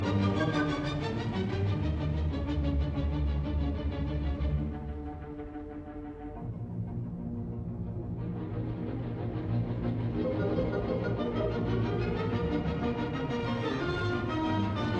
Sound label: Symphony in E flat major Scherzo Allegro vivace Trio; Symphony No 3; Op 55; Eroica; Bruno Walter